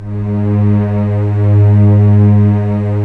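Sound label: string section; Roland - LA Composer vol. 1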